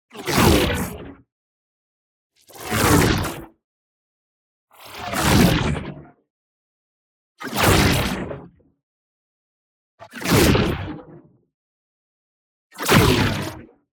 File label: WHOOSH SMALL Charge Collide; boom library; Matter